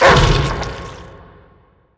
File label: attack; smite; god scylla